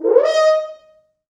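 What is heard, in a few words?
French Horns D#4; East West; Quantum Leap Horns Sax; 4 FrHorns FXMOD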